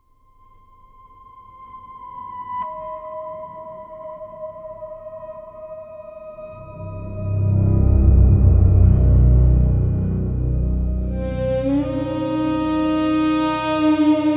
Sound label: spiritual spooky soundscapes